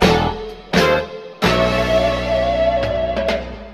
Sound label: guitar, Loops, Phonky